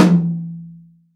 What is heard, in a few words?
mid tom single hit e key, Royality free tom tuned to the E note. Loudest frequency: 472Hz